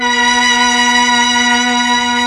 STR, STRING

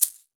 Shaker, Percussion; Roland BK-5

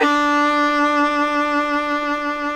fiddle F D4; Country; FIDDLE NOTES

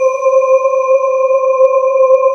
SPECTRUMED, KORG O1/W; Rubber Chicken Software Sample Bank

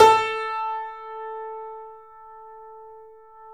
A, 3-D Audio Collection, 3D Pianos, Yamaha C7; E-MU Producer Series Vol. 5

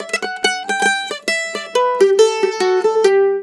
MANDOL 140, Celtic Flavours; USB Soundscan vol.42; AKAI

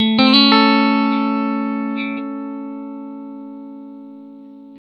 blues guitar riff 120, Vintage Blues Guitar; USB Soundscan vol.22; AKAI

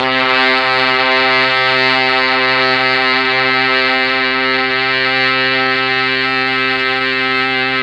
C2 Brass, Mellotron MKII; Mike Pinder - Mellotron Archive CD

